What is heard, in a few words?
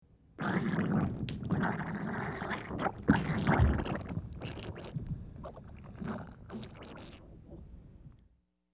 Alien Drips Large Rev, slime